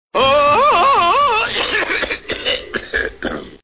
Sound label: Tarzan Alert